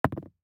DICE on Neoprene, Throw and Roll, Metal, 1 One Die; Samples; ArticulatedSounds; DICE Sfx Party (Extended)